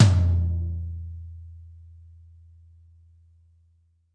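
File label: low tom, Classic Hip Hop; STYLE KITS; Classic Hip Hop Mega Kit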